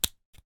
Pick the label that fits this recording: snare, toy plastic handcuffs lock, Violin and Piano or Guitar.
toy plastic handcuffs lock